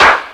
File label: CLAP, Big Beat; Big Beat Samples & More; AKAI; CLAP KIT